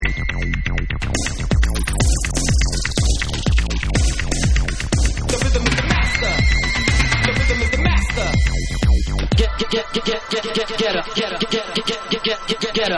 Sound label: OCTAVE BASS